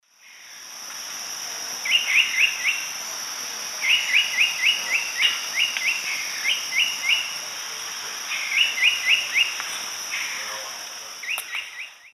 Tyranneutes stolzmanni, call; S. America; Eastern Lowlands

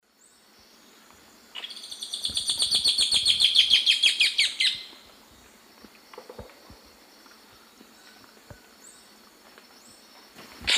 Chloroceryle amazona, song and calls pair; S. America; Eastern Lowlands

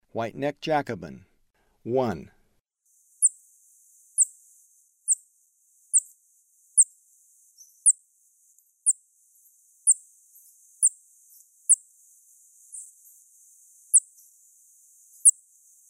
White-necked Jacobin; S. America; Eastern Lowlands

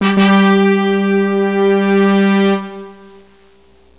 TADA, SYNTH

